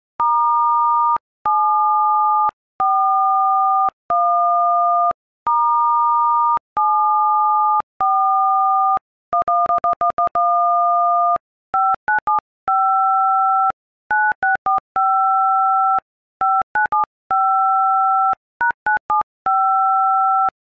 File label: theme song on iphone keypad